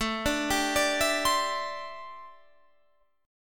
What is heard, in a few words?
D 9th Suspended 4th A